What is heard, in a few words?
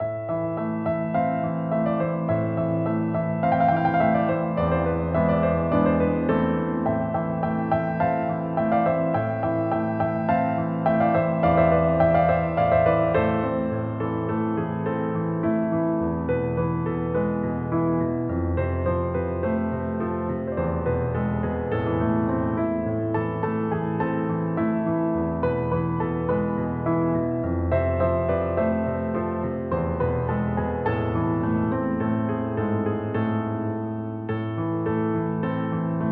piano